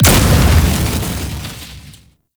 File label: Plunge Ability Angry Explosion; Enemies; Abilities; Warframe; Duviri; Dax Knight